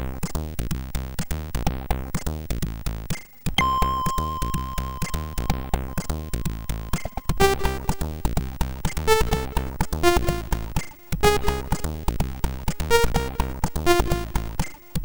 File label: sid MOS6581R2; Alien 8